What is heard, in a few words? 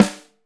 5X14, Drums; Big Fish Audio; Ross Garfield Drums VOL-2